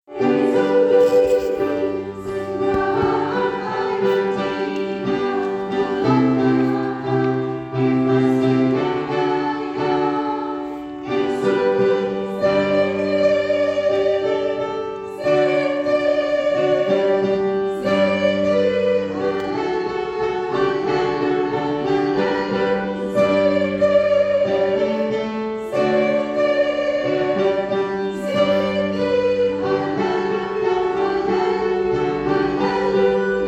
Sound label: Soprano